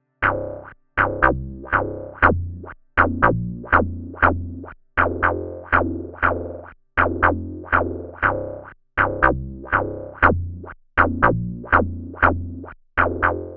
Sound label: OP-1 DNA